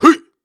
Grunts and Chants, Viking Vocal

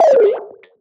Retro FX; Cymatics; Video Game Sample Pack Vol 1

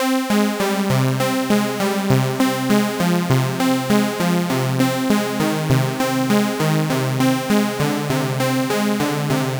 Arp Loop - 100 BPM C Min, Synths; Loops; Cymatics; EDM Starter Pack

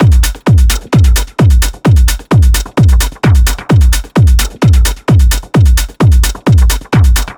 Drum Loop 130, Techno

